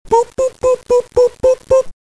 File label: boop